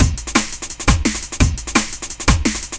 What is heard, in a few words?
Break, Jungle Breaks; Friday